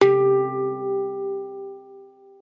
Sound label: hang